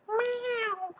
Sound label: meow; Text-to-Meow